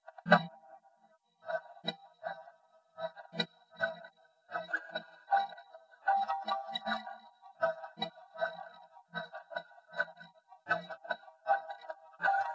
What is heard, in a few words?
voice